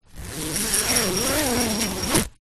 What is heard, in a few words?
sleeping bag zipper undo slow gentle; foley